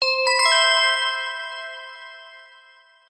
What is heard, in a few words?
SMS Bell Notice; Pantech; Flex P8010